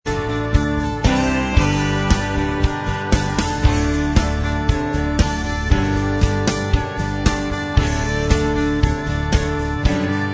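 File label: instrumental, backing track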